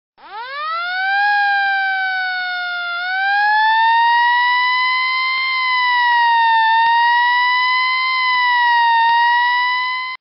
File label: Mumbai Police Siren